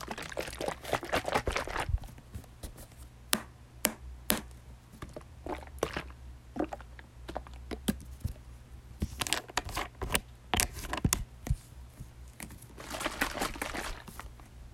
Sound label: Bottle